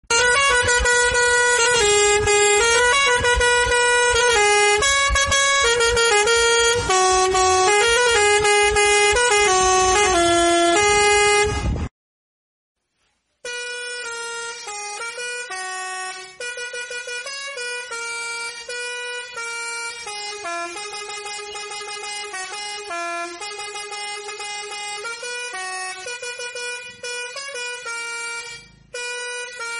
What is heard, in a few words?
Sri Lankan Bus Air Horn